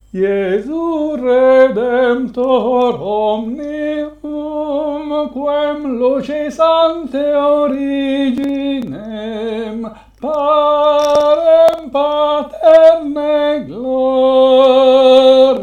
Jesu Redemptor omnium - Tenori

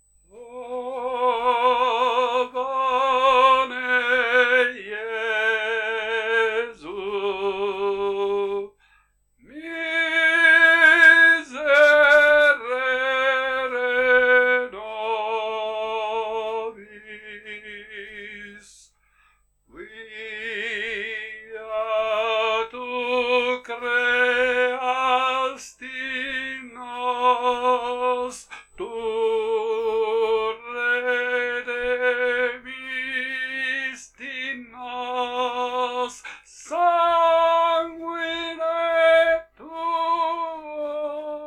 O bone Jesu - soprani; Monteveglio

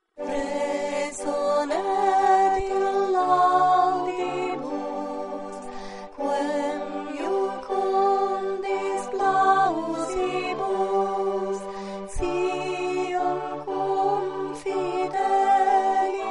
Resonet in laudibus - Contralti+Base; Puer natus

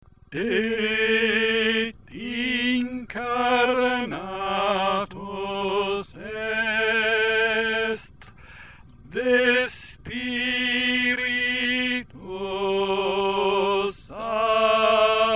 Et incarnatus est - Tenori; Natum de Maria Virgine

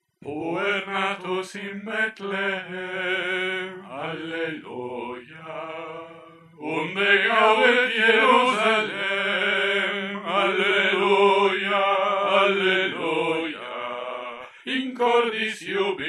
Puer natus in Betlehem - bassi; Natum de Maria Virgine

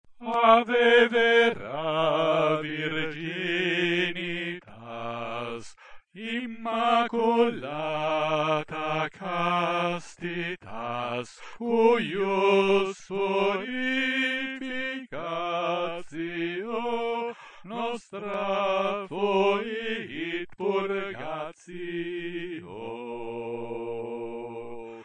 Ave vera virginitas - Bassi

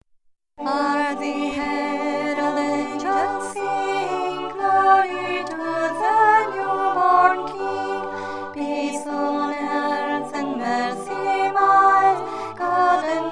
Hark the herald - Contralti; Natum de Maria Virgine

